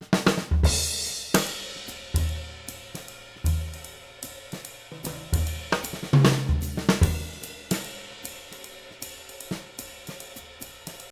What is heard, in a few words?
SWING, Living Drums